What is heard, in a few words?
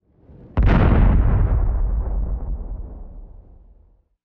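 explosion extra builds deep; weapons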